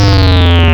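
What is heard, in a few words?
square Sync, wavetables; Animoog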